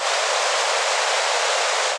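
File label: speaker pink noise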